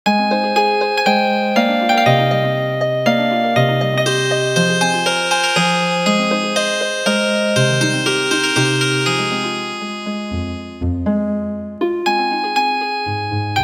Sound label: Healin Harp